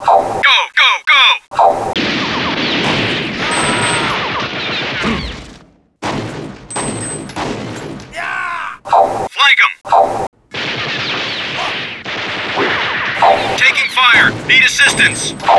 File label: traffic battle